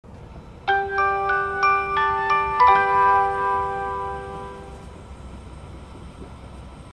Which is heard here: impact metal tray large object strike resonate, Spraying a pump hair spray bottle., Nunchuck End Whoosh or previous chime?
previous chime